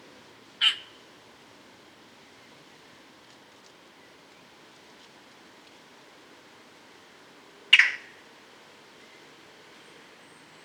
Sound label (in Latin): Pica pica; SETE